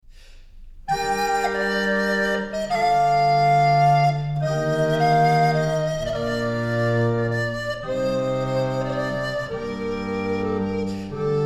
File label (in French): Sarabande faux bourdon flute à bec